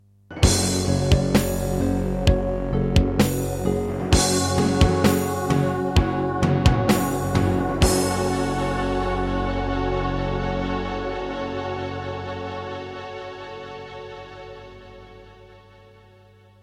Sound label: Diamond Monster Sound 2MB; Doom (Sigil); Introduction